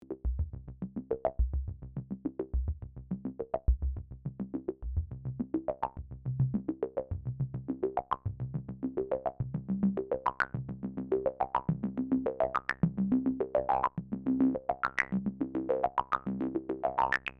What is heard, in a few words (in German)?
Bandpass und LoPass in Serie